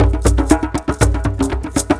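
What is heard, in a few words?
120 COMBO, drum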